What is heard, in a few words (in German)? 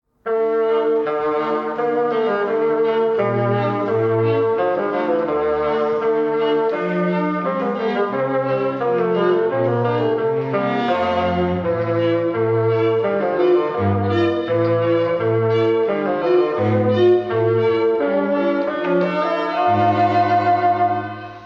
Quartett